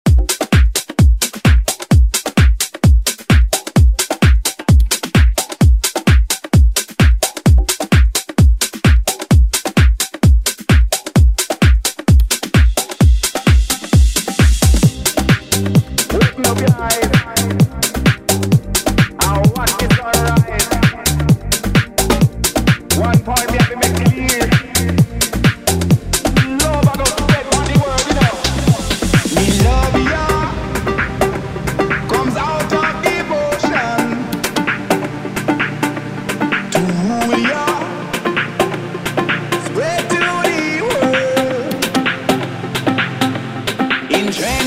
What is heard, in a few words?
Latin House